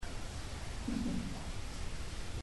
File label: alcatraz laugh